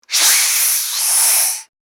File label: Snake aggressive hiss